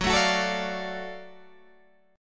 Gb Minor Major 7th Sharp 5th Gb down Guitar Open G